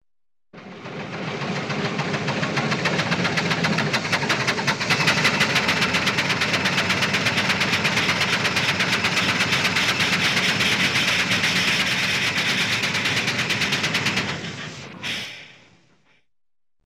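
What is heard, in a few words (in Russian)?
звук механизмов трактора работа и остановка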